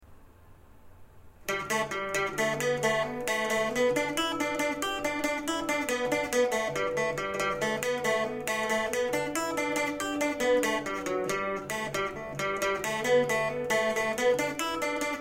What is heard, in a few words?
bouzouki